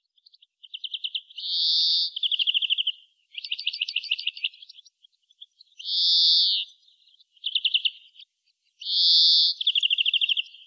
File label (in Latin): Chloris chloris; SETE